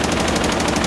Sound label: machinery; Ambient; MX vs ATV Unleashed